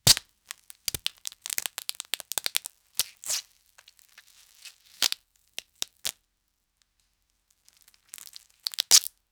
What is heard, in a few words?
textures plastic wet